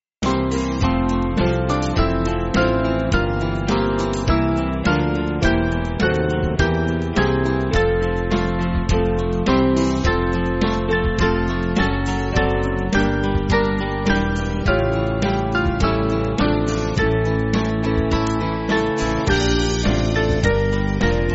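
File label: Band, Music Backing Files for Churches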